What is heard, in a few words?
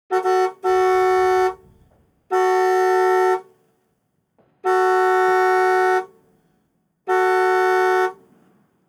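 car horn; Smeshariki Cartoon Studio; Avto Gudok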